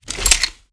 smg equip

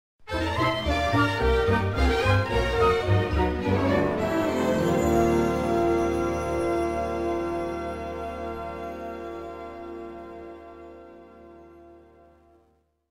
Sting Quarry Reveal; Family Guy Back to the Multiverse

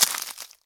climbing ice; Scrat; Ice Age: Scrat's Nutty Adventure

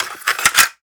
Load Cassette

